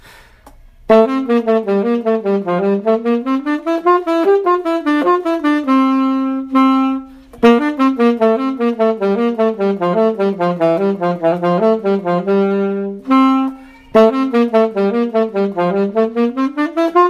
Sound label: tuba